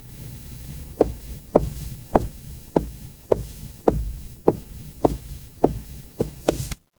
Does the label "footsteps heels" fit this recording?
Yes